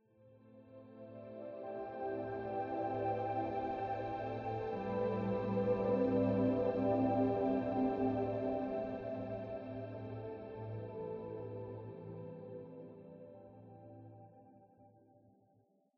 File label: Blur Transition